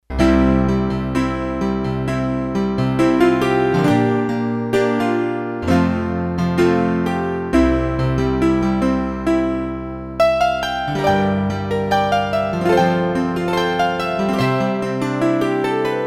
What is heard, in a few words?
Rich Piano, Roland D-10; Factory Patches